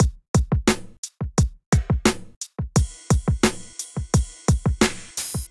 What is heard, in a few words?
Sugar Kit, Hip-Hop Drums; Golden Era; Ableton; Sound Oracle